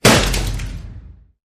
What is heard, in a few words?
Metal Floor Stomp